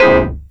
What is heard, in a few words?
SFX, Drum & Bass, 160 BPM